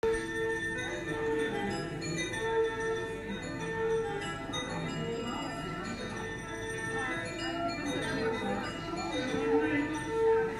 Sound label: Soundscape